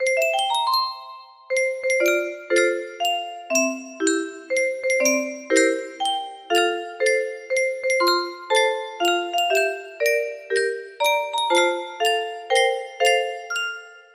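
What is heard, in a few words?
30 note, music box melody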